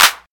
clap sound f key, Royality free clap sound tuned to the F note. Loudest frequency: 4247Hz; percussion; single hits one shots